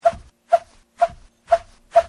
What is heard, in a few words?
Sand Bag, 布袋に入れた砂を、両手で急激な力を与えて鳴らした音; 砂袋での音の解析; オクターブ上に小さく音が発生している。ガラス容器のようなはっきりとした倍音構造にななっていない。3倍音は全然確認できない。; 鳴り砂